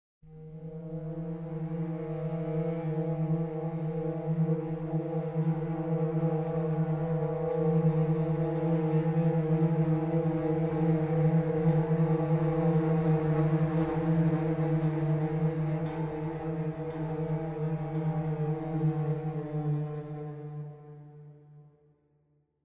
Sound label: Tension Block